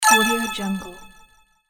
puzzle sound

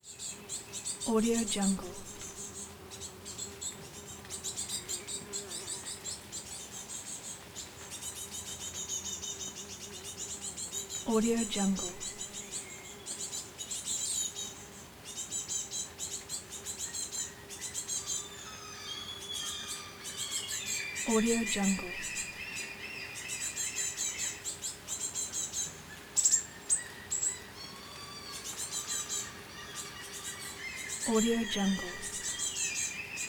forest birds and bees ambience

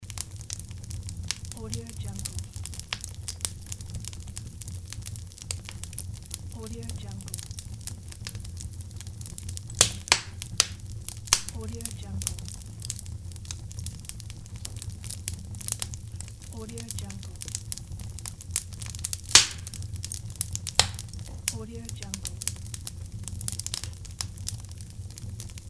fireplace close

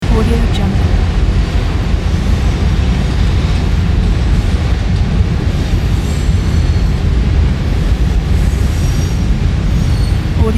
cargo train arriving at the station

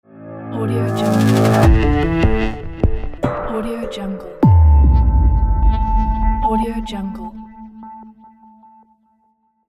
antivirus ident

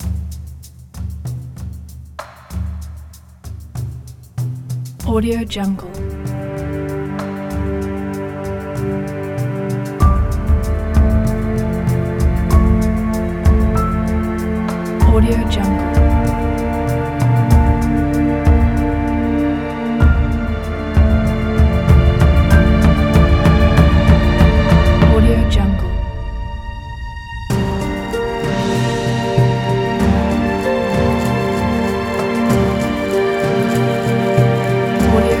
cinematic drama

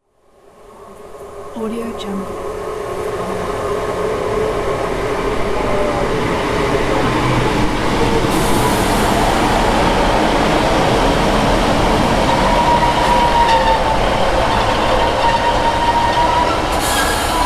commuter train

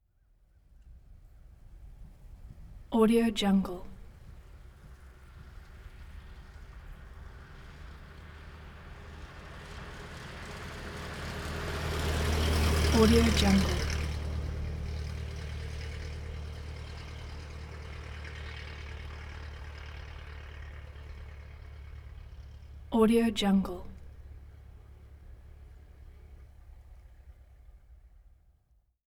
antique vehicle pass by medium speed; prosoundfx

